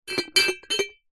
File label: household teapot ceramic lid remove; zapsplat